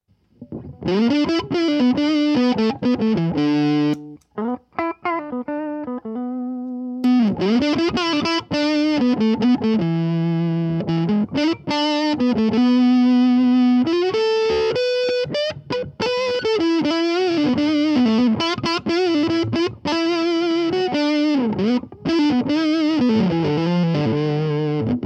Lower Gain Riffs

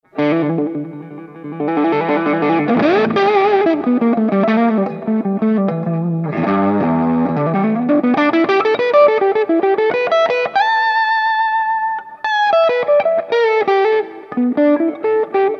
cosmos medium gain volume knob

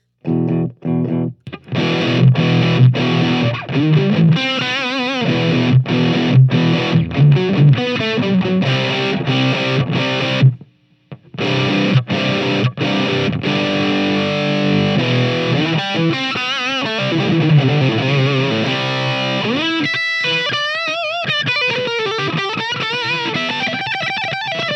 Riffs; ScrewDriver

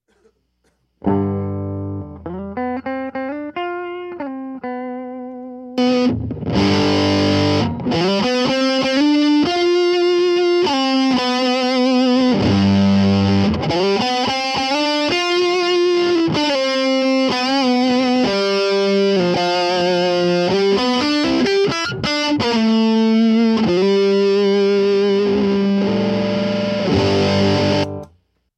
Gain Down a Bit

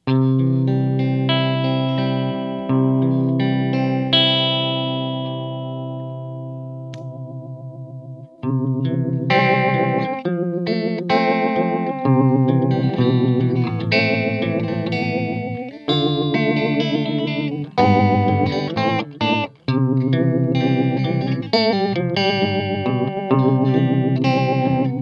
Fast Vibrato; Paradigm

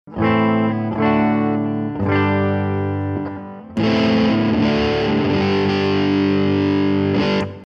Light, Mayonaise pedal

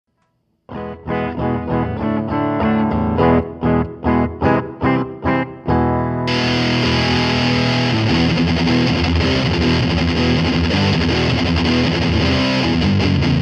Heavy, Mayonaise pedal

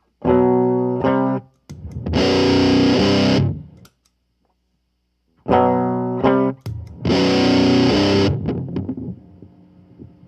Brewtal in Standard Tuning; Zero Demo

